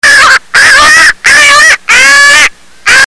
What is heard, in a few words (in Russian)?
crow umiraju; data crow